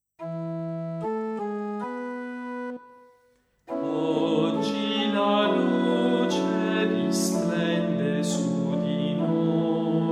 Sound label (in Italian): Salmo Natale Aurora